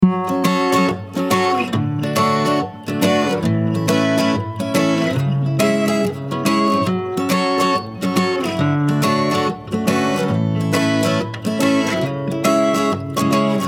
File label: 140 BPM Fm; Island